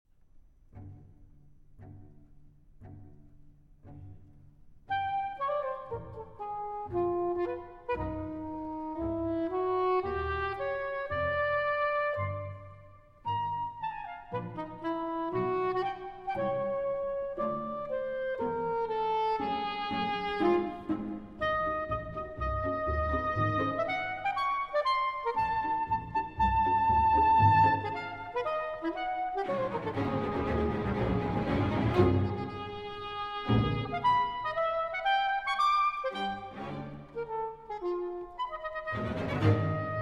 Saxophone Concerto, March, Strathspey and Reel, Live